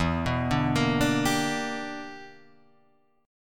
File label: E Minor 7th Sharp 11th E; arpeggiated